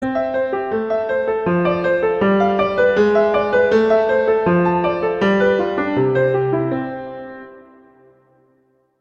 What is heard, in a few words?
Prelude I - C major; Clementi; Preludes